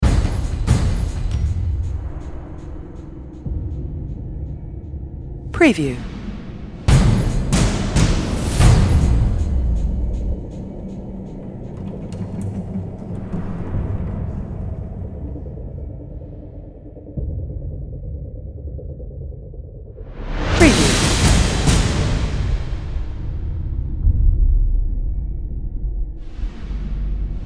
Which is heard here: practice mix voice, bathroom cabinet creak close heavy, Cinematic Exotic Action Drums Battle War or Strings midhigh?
Cinematic Exotic Action Drums Battle War